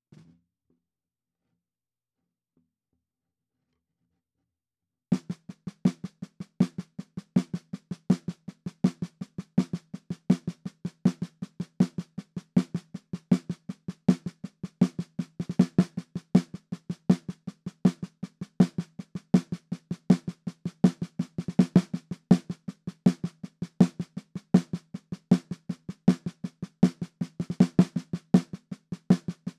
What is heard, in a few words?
perc intro snare